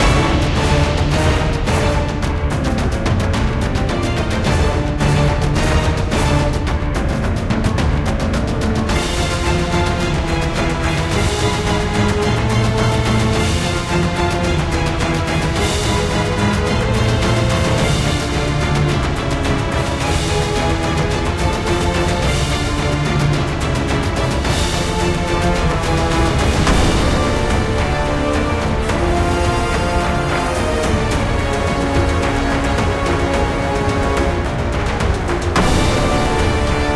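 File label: background music loop; Naruto Ultimate Storm; android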